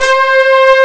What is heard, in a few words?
mt32 lead; Amiga Soundtracker Sample Packs